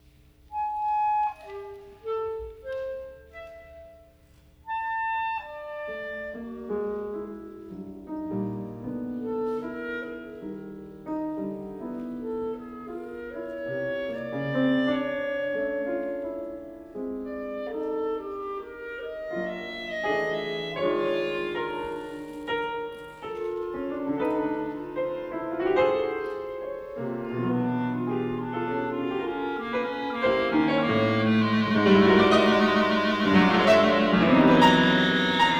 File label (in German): Vier Stucke fur Klarinette und Kl